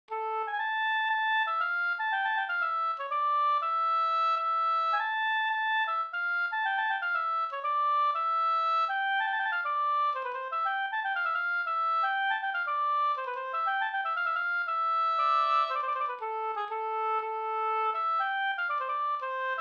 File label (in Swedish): polska Bodapolska